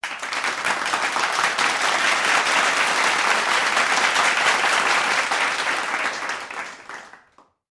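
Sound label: heavy applause clap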